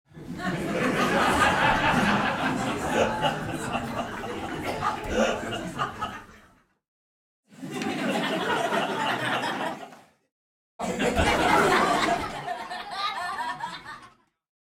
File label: Sitcom Laughter Small Audience